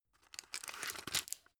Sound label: drug pull out; arzt